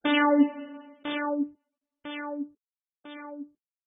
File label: half note delay